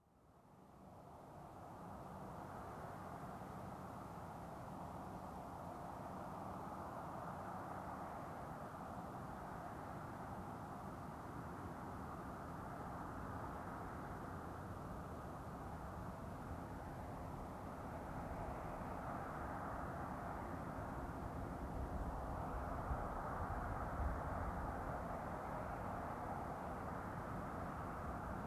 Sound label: Truck approaching and parking